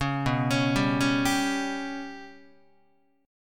B Suspended 2nd Sharp 5th down Guitar; Bsus2#5 arpeggiated; JGuitar

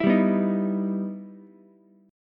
E Minor Major 7th, Ukulele; EmM7 strummed

